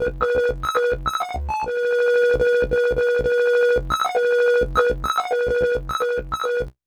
Modular, sci-fi

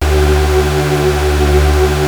Prophet 5, D3; Hollywood Edge - Giorgio Moroder Rare Synthesizer Collection

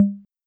Tom, Roland TR-66

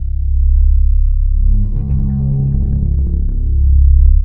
STOP; USB Soundscan vol.33; AKAI; Disjointed Textures

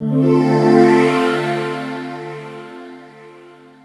chord, 145 BPM, drum & bass; Ueberschall; Drum & Bass Flash Trackz

